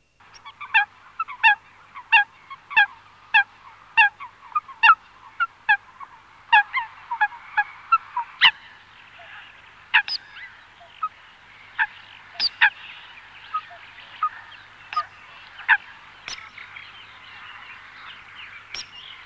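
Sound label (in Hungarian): Szárcsa; Madarak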